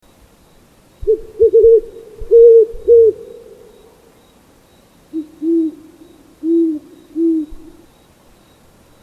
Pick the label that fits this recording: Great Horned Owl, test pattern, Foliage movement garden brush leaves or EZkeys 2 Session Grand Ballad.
Great Horned Owl